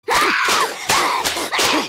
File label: section fail; Chika - 1.2.1